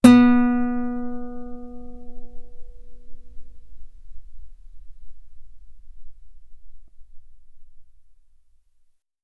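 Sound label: Guitar ff B string B3; OmniPower4296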